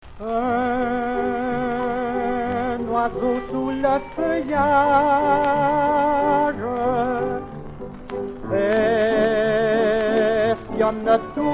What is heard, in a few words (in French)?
coucou valse chantee